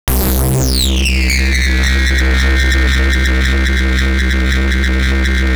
Reso Screamer; AMG - Prototype Drum & Bass

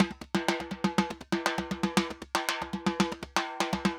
Timba Samba 120 bpm; Best Service; Festa Latina VOL-1

